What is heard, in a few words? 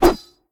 mvt whoosh; smite; gilgamesh